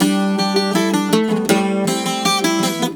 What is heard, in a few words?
Celtic Flavours; USB Soundscan vol.42; AKAI